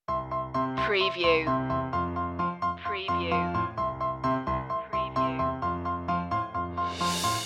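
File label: orchestral loop intro; o come all ye faithful; media music now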